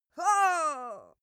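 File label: female death, screams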